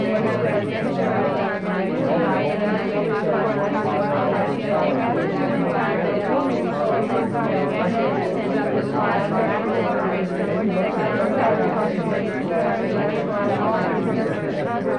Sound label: babble